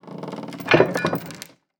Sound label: Item Wooden Chest Open or Close; Game Essentials